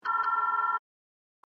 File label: alert, computer